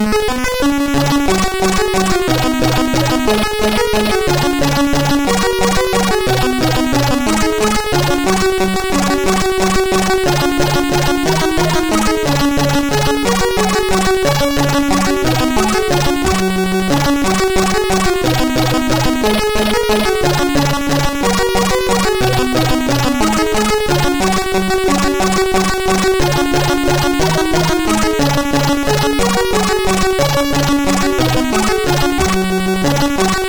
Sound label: We Wish You a Merry Xmas, sid CSG8580R5